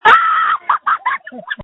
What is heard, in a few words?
screams that feature laughter; screaming into a phone; many callers laugh when they call the JUST SCREAM line